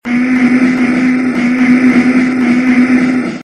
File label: buzzing; Gachitale